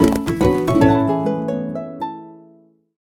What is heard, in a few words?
Level Finish Jingle; SpongeBob Krusty Cook-Off; android